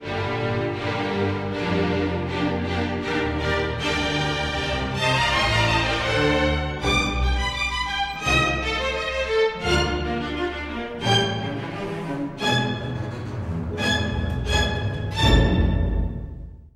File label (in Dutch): strijkers